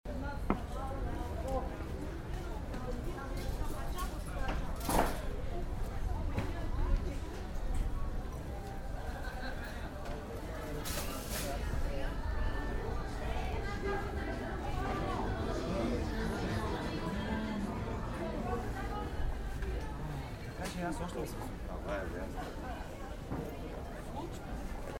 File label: ambience